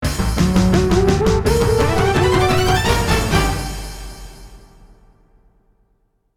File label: GAME OVER, MT-32; Phalanx